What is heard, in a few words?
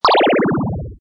death retro